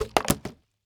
plastic soda pop bottle full drop roll liquid light fizz